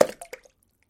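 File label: water splash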